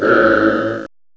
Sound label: CHOIR, HUMANS; M8; CMI Factory